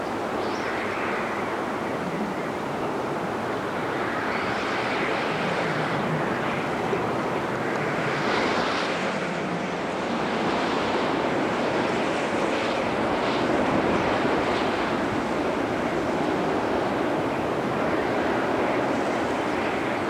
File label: ambience wind